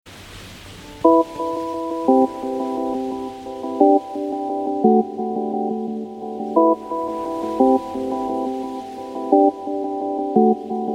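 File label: calm SMS; Спокойная смс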